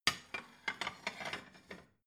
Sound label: plate shelve slide soft